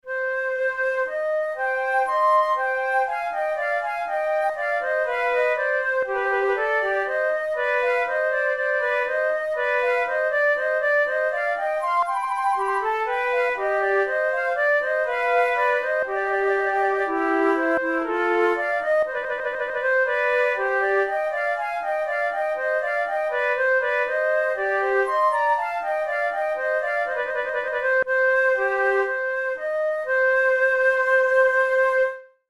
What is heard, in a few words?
prelude, flute